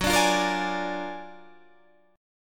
F sharp Augmented Major 9th F sharp Guitar Standard; strummed; JGuitar